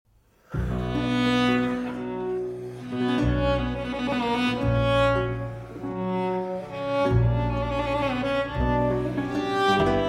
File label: Cello Sonata No. 7 in D Major, Largho; Platti